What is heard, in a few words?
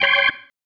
Stab, Organ, One Shots